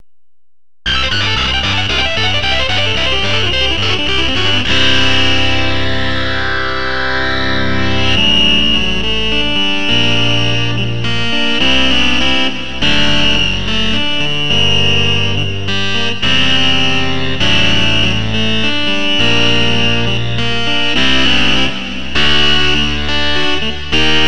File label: radioactive pad